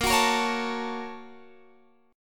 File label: A sharp Minor Major 7th Sharp 5th, down, Guitar, Open F; A#mM7#5 strummed